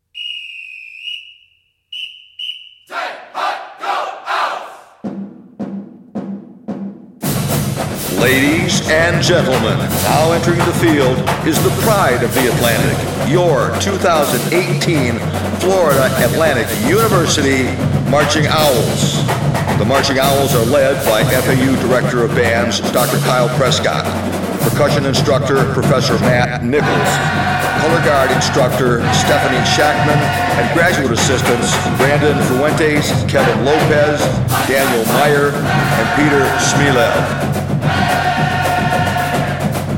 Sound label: run on and fanfare